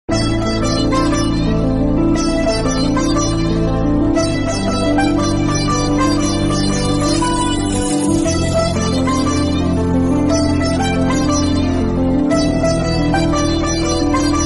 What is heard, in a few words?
ringtone telugu bgm; vunnadi okate zindagi